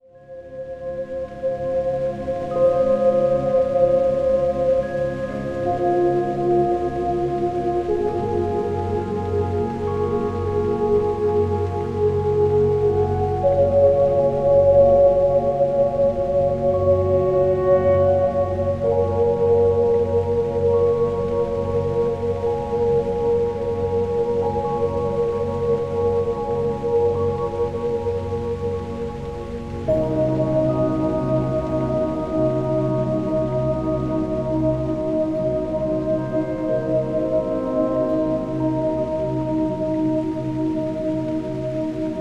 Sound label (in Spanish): Sonidos de la Naturaleza Relajacion, Healing Music